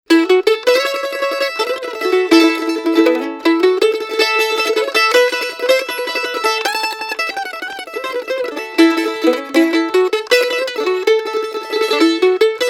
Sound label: Mandolin